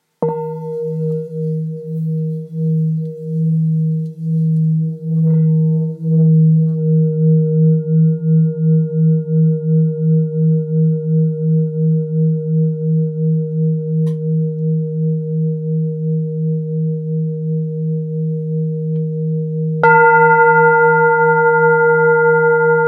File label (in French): Bol Thick re diese